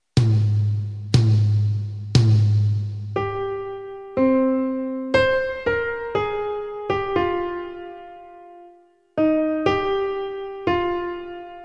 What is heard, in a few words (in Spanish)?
dictado; conservatorios profesionales asturias